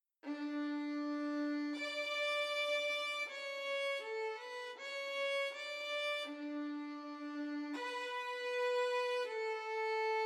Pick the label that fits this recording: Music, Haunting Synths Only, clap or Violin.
Violin